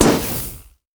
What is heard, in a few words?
blinding dart; LoL; teemo